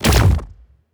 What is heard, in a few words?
Weapon Shoot